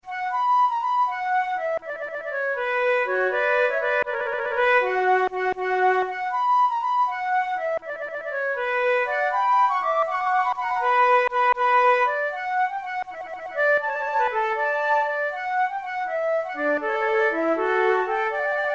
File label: two flutes forlana; Monteclair; 6 concerts; flute tunes